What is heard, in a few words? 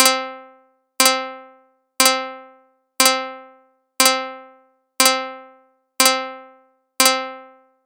8 clicks 55ms